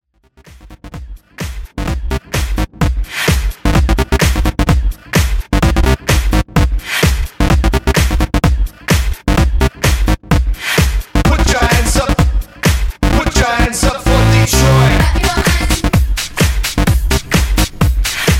wordplay, 128 bpm

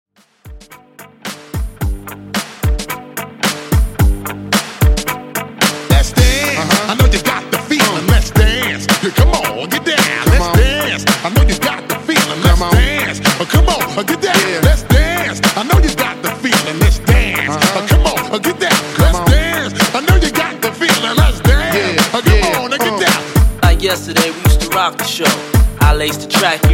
90s RnB Redrum 110Bpm

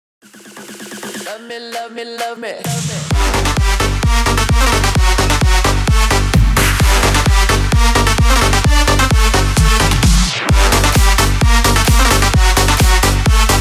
130 BPM

